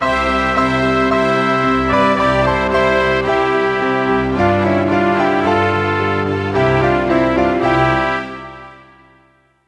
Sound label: Croatia, Fanfare; 1997 MMX LAN PACK (win 95); Worms Armageddon